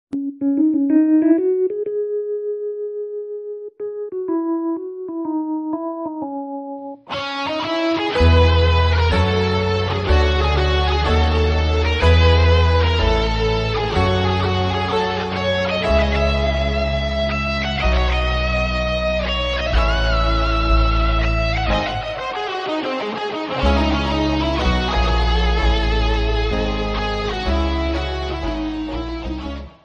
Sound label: tamil bgm ringtone, south indian; punnagai mannan movie